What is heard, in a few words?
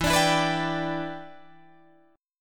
E Minor 7th, Guitar, Drop D